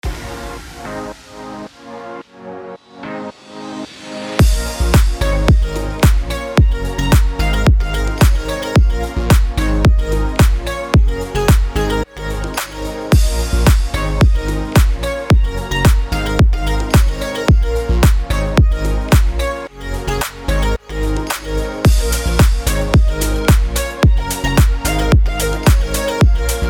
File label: BED TROPICAL HOUSE A#MIN 110BPM